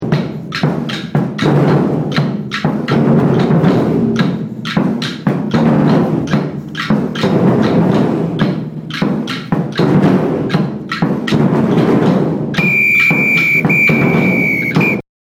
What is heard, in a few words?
Swing Do Pelo Break 2 Surdo and Agogo